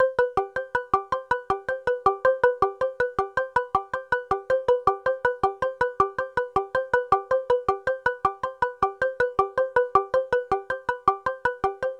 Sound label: Seq Turnaround, Drum N Bass Keys Pads; Sonic Foundry (Sony Creative Software); Knutrix Drum & Bass